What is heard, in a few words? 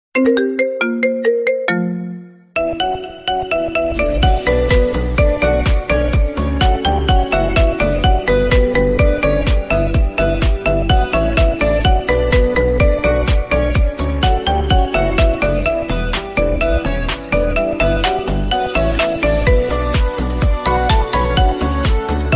Marimba